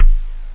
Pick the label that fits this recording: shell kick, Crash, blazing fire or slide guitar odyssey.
shell kick